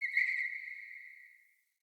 Bow Arrow By Charged Echo; Weapons; Player; Bows; Josalfar Bow

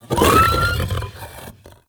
Pain Growl; Enemies; Vocals; Warframe; TheNewWar; Archon; Amar

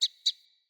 Sparrow Double Chirp; Animals; Vocalizations

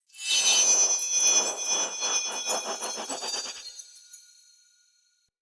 Throwing Knives Orbital Projectile Start; Pothia

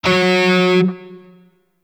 Shawzin Oct Two F Sharp; Warframe; Grineer Shawzin

